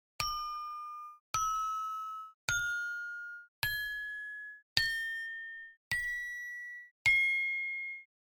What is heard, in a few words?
saron peking pelog